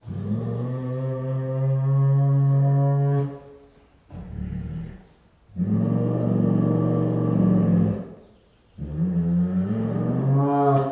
Female Bengal Tiger at Zoo, with Long Growls, Snarls and Snores; Tigers; Sound Ideas